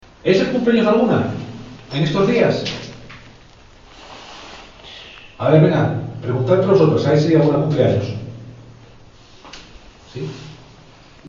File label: sssh